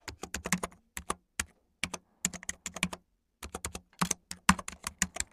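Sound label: computer keystrokes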